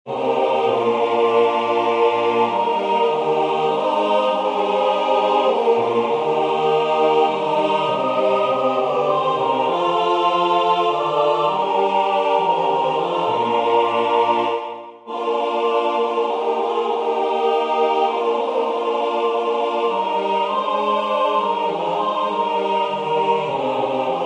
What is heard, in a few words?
Tutti, chorale; Hymne à la nuit; Concert du 13 décembre 2024 en l'Église St Pierre - St Paul